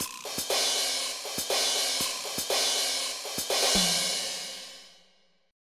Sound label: funk